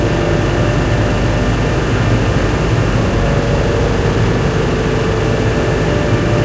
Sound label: hetzer engine int rpm 66, tanks; engines; War Thunder